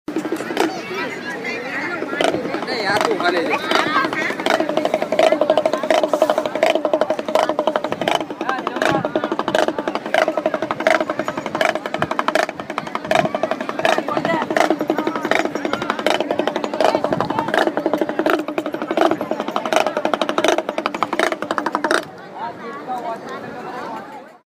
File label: chowpaati, mumbai